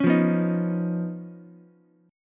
D sharp 7th Suspended 2nd Sharp 5th Ukulele; strummed; JGuitar

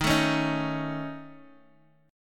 A Major Flat 5th E flat, chord

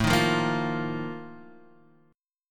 A sharp Major 7th Suspended 4th

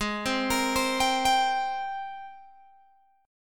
G sharp Major 9th G sharp, Guitar Open G

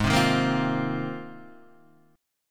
Ab 6th Add 9th Ab; strummed; JGuitar

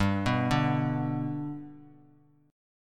G Major down; G arpeggiated; JGuitar

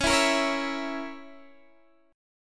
C sharp Minor 6th C sharp, Guitar Open D

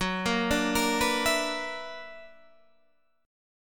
B-Minor Major 11th

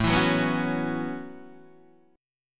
A 6th Add 9th A down Guitar Open D

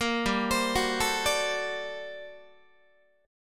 G Minor Major 11th G, Guitar; arpeggiated; 13,15,15,16,13,13; 4A,4E,5A,5D,5G#,6C#; JGuitar

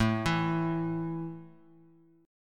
A 5th A down, chord; arpeggiated

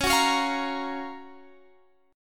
Gb Major 7th Db, guitar

